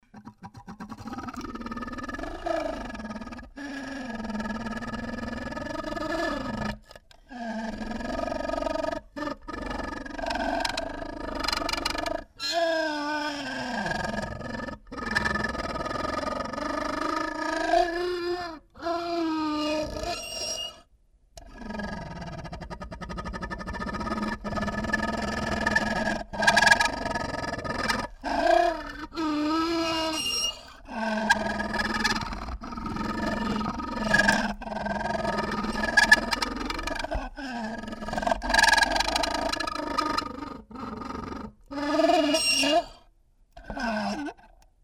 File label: Doorstop